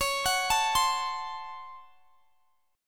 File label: C sharp Major 7th Suspended 4th Sharp 5th C sharp; arpeggiated; x,x,23,23,22,20